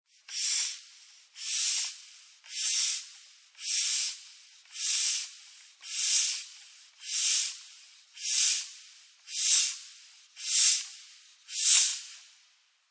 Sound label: Ball Pump, Multiple air pumps into a ball.; Equipment; Sports